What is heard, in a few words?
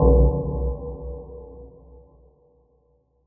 space ocean music stinger; half-life